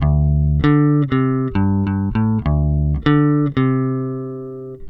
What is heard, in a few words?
SLIDE D, Bass Grooves; USB Soundscan vol.30; AKAI